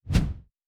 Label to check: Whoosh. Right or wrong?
Right